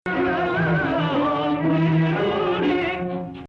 Rast Sihtu Rast (Sikah emphasis); Maqam Rast

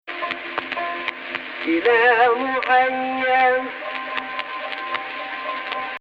AHH, Egypt Rast; Jins Rast

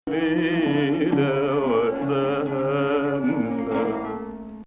Hijaz

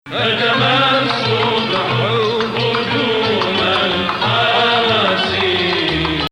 Sikah Jadaka Hijaz

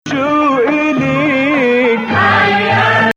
Nawa Bayati

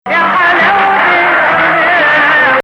Ajam

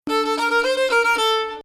Bayati Husseini Nahawand; Husseini 5 (Nah.)

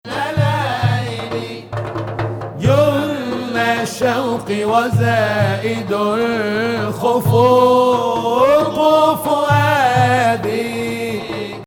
Hijaz Octave

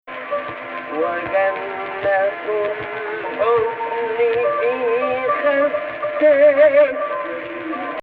AHH, Egypt Rast

